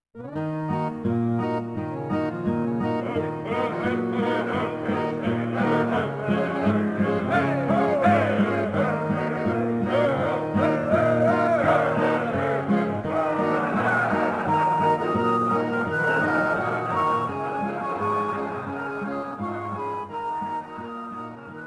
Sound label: sing song; Sid Meiers Pirates; XBOX